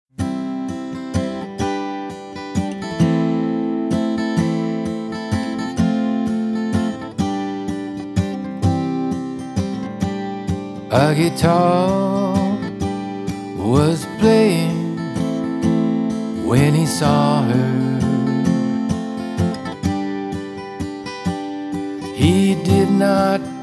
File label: waltz